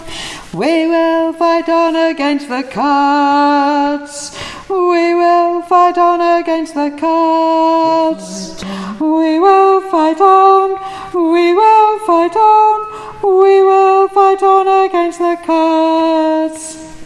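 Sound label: soprano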